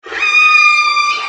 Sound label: orca sing